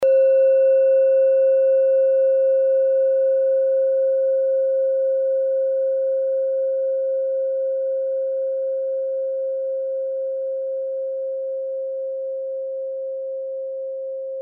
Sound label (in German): kleine klangschale